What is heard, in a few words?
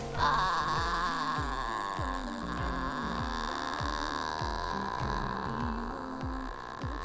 hope dying noises